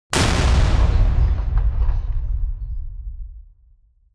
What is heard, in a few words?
Impact Explosion; soundscrate